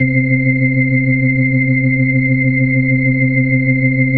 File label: C3F, B3